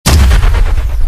gauss rifle

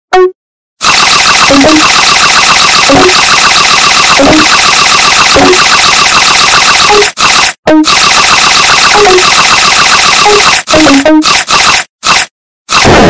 snake game sounds

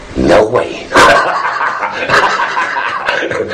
no way hahahaha

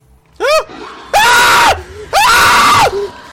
arrrrrgghhhhaa

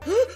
gulp; hajime

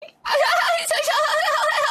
laugh; ninja

